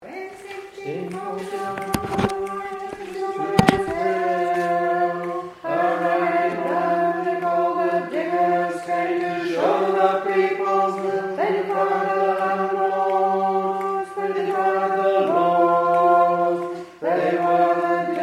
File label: Bass, singers